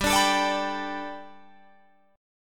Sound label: G Major Add 9th, Guitar, Drop D; down